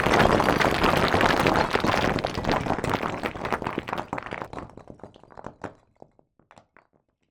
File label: debris bricks drop